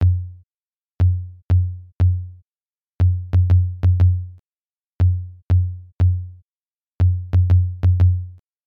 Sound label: tom